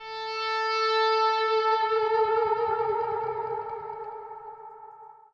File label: Guitar